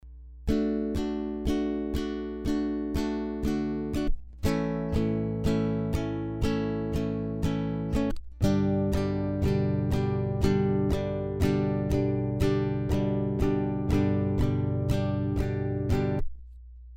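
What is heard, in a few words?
rhythm, Guitar 1 (basic strumming), D, A, G; fingerstyle; Guitar 1 MP3 (basic strumming)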